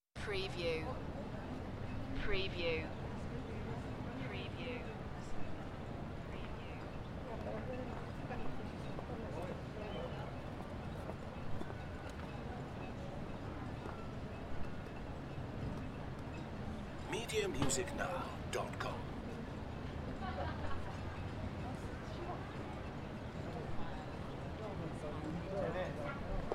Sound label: Spanish promenade in the day; beanstalk audio